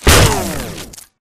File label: crawl grunt; Titanfall 2